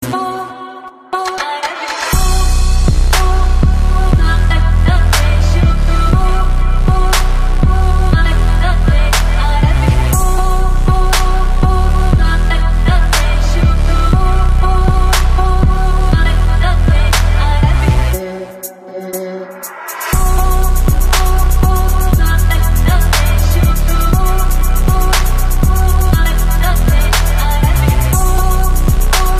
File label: ARABIC STYLE TRAP